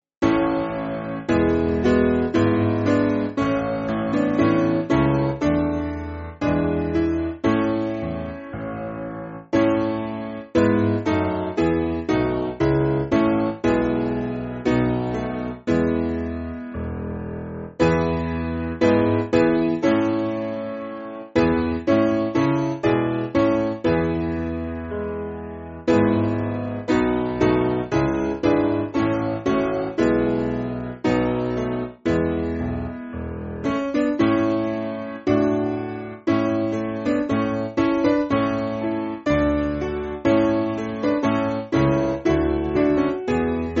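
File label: Piano, Music Backing Files for Churches; Now I Have Found; Madrid; 6/A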